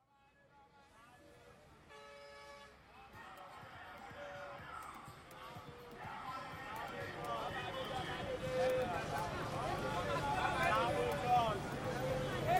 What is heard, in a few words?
soundscape Lagos